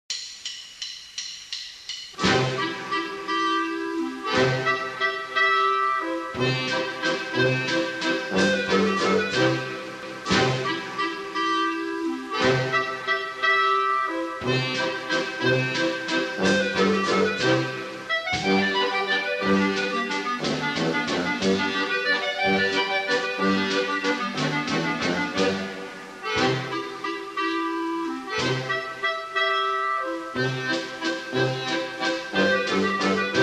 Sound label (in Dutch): Duitse dans, Tiroler orkest; Duitse dans van W.A. Mozart